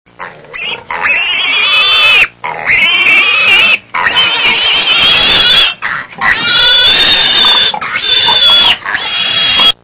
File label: Pig Crying